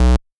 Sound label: bass